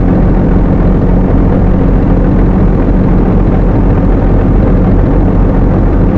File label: Vehicle Engines; Red Orchestra 41-45